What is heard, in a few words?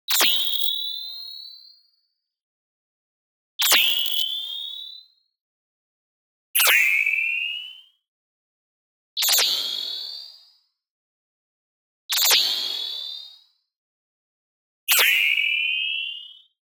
BLING Classic Style Shing; boom library; Anime Essentials